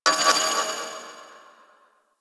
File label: Spring Bounce; Ambience; Warframe; Corpus Railjack